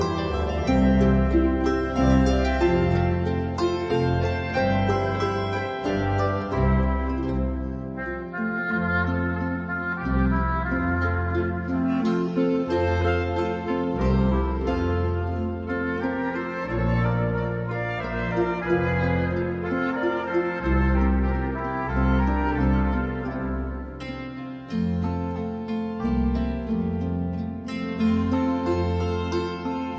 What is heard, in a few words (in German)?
Andante in C Dur